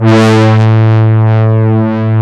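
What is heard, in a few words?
MEMOOG BRASS; E-MU Formula 4000 Series Vol. 3; Analog Odyssey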